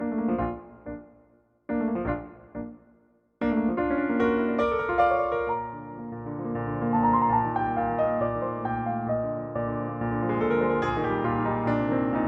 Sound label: PIANO Unprocessed; QUAD IMAGE; EXTRAWIDE; quadimager